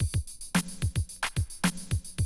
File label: Classic DrumLoops, 110